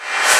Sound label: Reverse FX